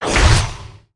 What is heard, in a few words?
ebeast attack; Akeron